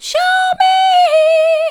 show me soft, vocal

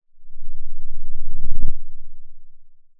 pop

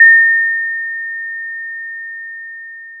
Sound of a school bell

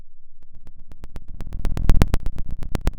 Sound of a fire crackling

